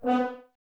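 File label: Brass French Horns B; Sample CDs; Miroslav Vitous; Woodwind Brass Ensemble; F.Horns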